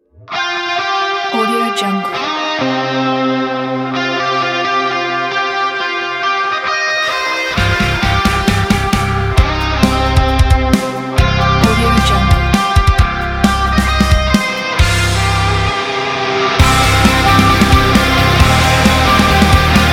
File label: alternative rock nu metal